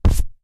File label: Jump; doodle jump 2; android; Mo Genie Balerina Jump